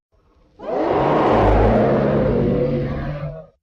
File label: wendigo roar